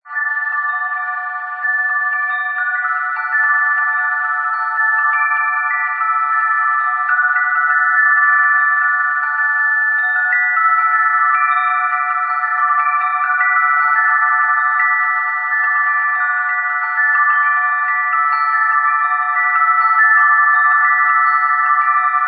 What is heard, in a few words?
spring wind chime